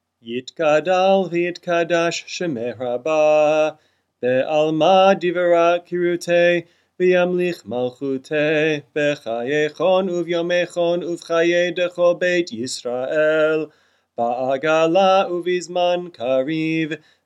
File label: kaddish shalem; weekday evening